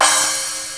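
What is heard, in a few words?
Crash Cymbal, Fairlight CMI III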